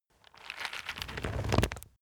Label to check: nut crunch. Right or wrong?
Right